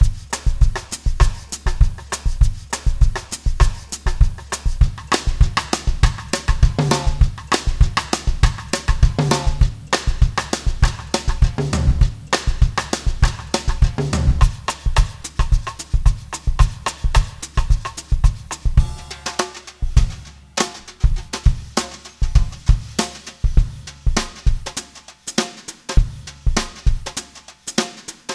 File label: latin heat